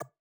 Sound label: hover; xrfragment